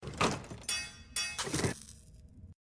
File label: Fail; Goddess of Trampling